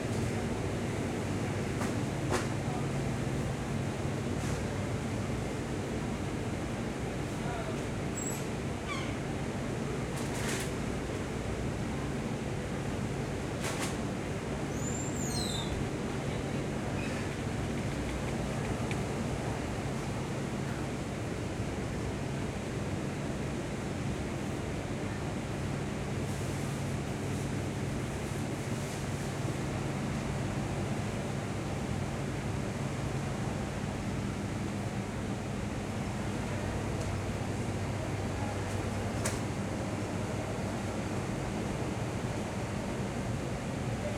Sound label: Shop Ambience, UK Shop; InMotionAudio; UK Shops